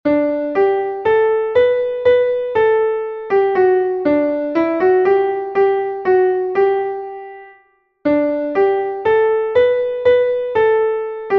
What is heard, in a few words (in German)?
klavier; MelodieMeister